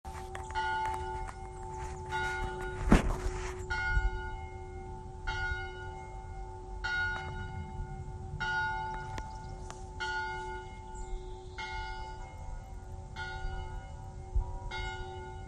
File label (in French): Cloches